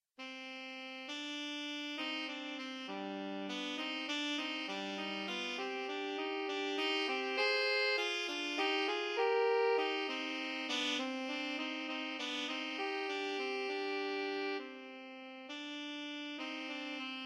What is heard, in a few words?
sax, saxophone duet